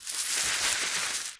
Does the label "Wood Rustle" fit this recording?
Yes